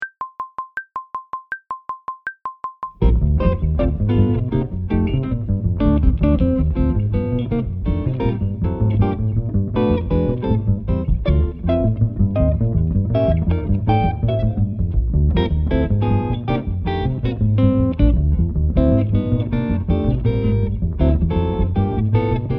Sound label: Very Fast Swing 320bpm, Brushes; Phrasing and Freedom with Brushes